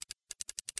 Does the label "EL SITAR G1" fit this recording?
No